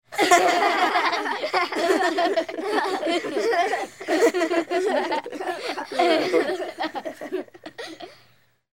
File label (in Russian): smeh gruppy